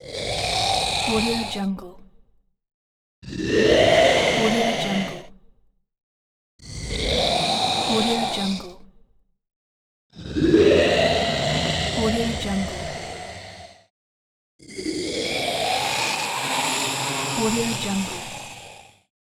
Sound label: zombie growl